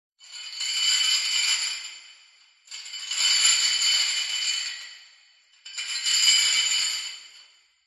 horror chain shackles movement dungeon prison reverberant